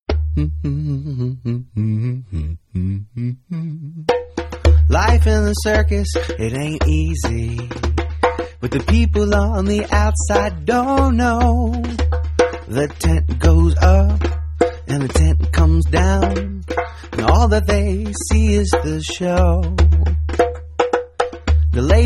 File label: laugh